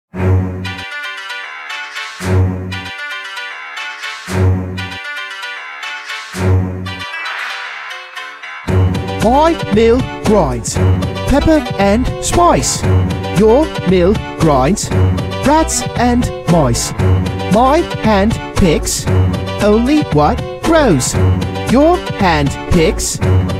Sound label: medieval diss track FULL SONG